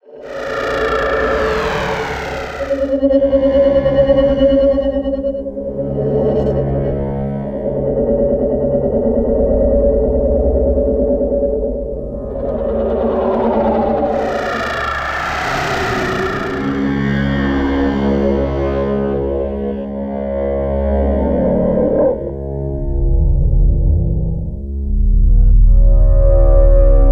texture time slice

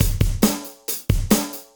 CB LOOP; Zero-G; Chemical Beats

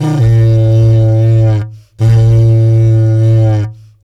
120 DIDGE; Spectrasonics; Supreme Beats; World Dance; in OZ!

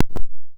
CLIK ON, PERC; Crypton; DrumBase5000; PERC.COMBI

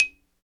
XYLO, Afro Xylophn

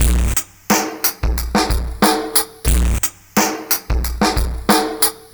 R&B Drums; Sonic Foundry (Sony Creative Software); Live n Ruff

